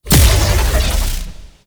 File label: Infested Lich Parasitic Link Cast; Warframe; Abilities; Zeke